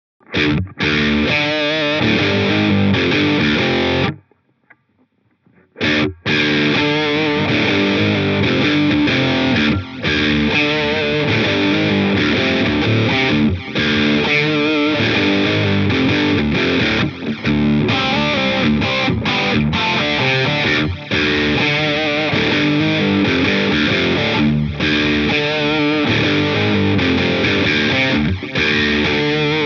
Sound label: Digital Pristine, echosystem